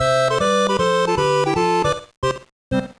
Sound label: jingle